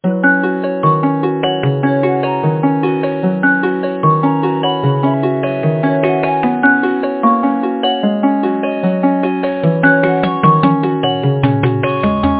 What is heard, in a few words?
rain; showers